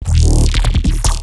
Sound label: Growl Bass; neuro; BIG5AUDIO